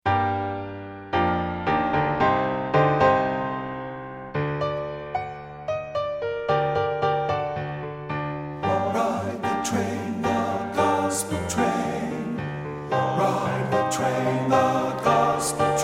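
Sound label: tenor; satb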